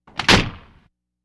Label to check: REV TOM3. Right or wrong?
Wrong